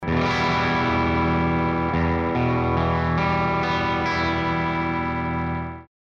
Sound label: Single Coil Bridge, stratocaster loaded pickguard